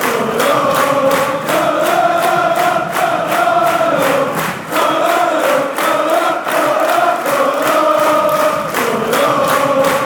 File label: schalala fan chants